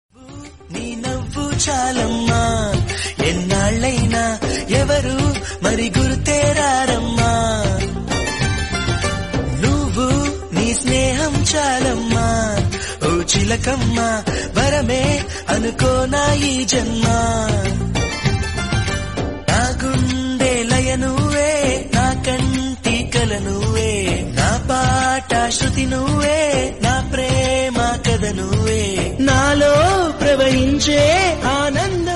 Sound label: telugu romantic song